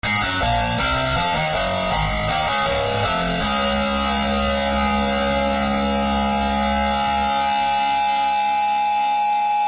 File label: nokia power rock